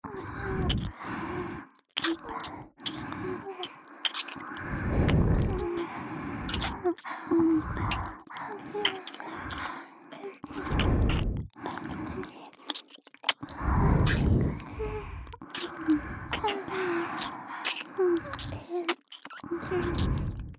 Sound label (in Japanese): 唇両耳4wayキスループ; ミアシアと唇両耳4wayキスループ; ASMR